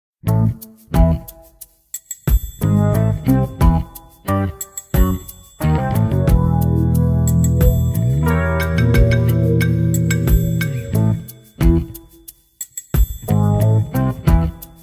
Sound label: Sneaking Around; Zexal Sound Duel 5